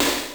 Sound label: Snare, Genesis; Sword of Vermilion; M8